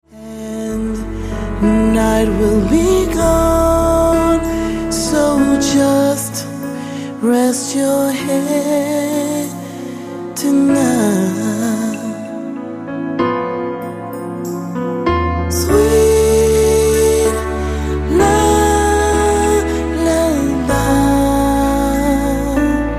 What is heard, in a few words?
Lullaby